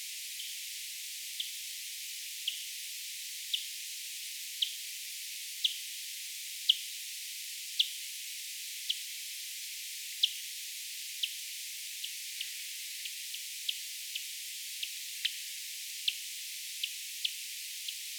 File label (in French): FM nasillarde E serotinus; ss-bois; Correze